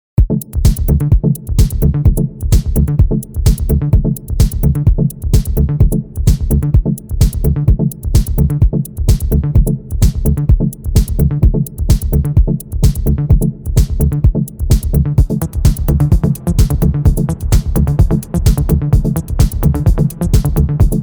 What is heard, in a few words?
Progressive House